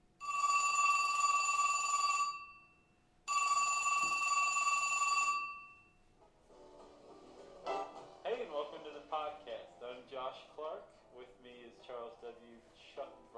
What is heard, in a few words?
stereo recording, asus transformer prime